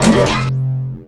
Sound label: Pickup Scifi Energy; SFX Kit